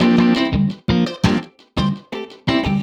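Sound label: Guitar Funkjaz, Drum N Bass; Sonic Foundry (Sony Creative Software); Knutrix Drum & Bass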